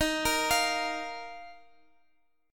D sharp Suspended 2nd, Guitar, Open G; chord shape

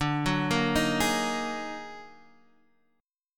Eb Minor Major 11th

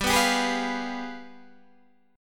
G 7th Flat 9th, Guitar, Open D

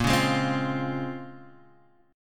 A sharp Minor 9th, A sharp, down; strummed

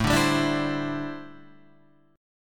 D 9th Suspended 4th A

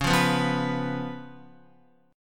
C sharp 7th C sharp, Guitar; strummed

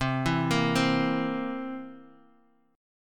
C 7th C down, C7; arpeggiated; JGuitar

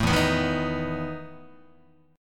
E Major 7th Suspended 4th E down Guitar; EM7sus4 strummed

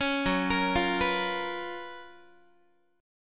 F sharp Major 11th Guitar; F#M11 Chord; arpeggiated; 16,14,13,15,15,x

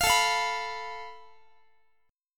A Suspended 2nd Sharp 5th, Guitar; Asus2#5; strummed; 4A,4E,5A,5D,5G#,6C#; JGuitar

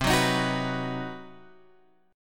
C Minor Major 7th Flat 5th, Guitar, Open G; down; chord shape

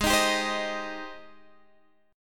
C Minor Ab; Cm/Ab strummed

